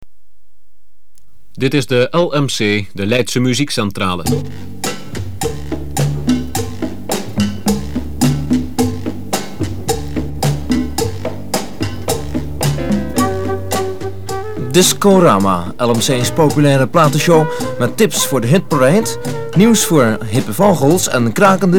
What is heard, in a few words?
tune; Discorama